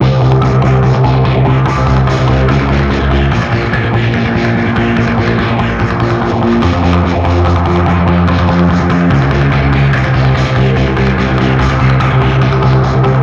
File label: distorted, 145BPM